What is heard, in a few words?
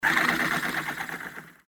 drill